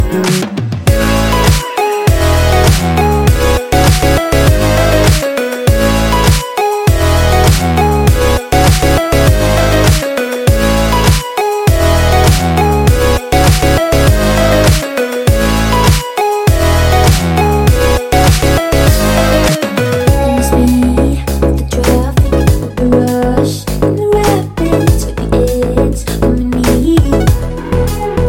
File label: remix